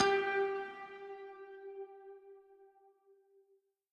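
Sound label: Music Maker Instrument 3 G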